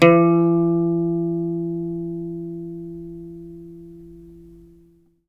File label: LUAN E3; Spectrasonics; Heart of Asia